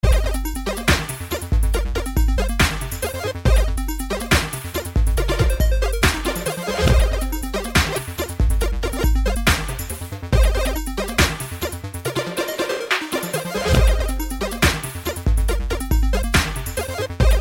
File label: 8 bit dubstep